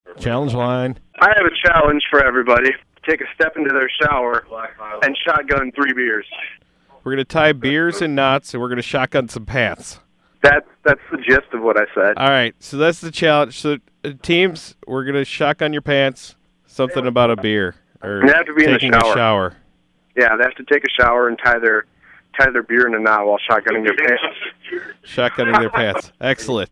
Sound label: Shotgun Beer